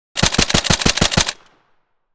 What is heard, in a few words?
AR10 machine gun firing short; guns